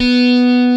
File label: B3 TELECASTR, BassGuitar; AKAI S6000 CD-ROM - Volume 1